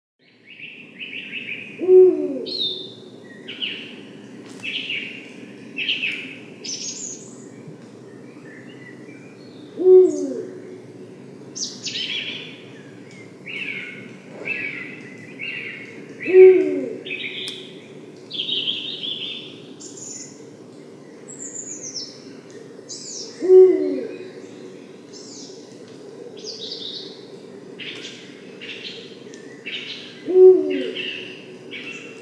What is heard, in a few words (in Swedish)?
berguv; fagelljud